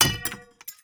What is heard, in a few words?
glass hit; teardown; ps5 windows xbox series xs